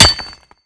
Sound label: pickaxe use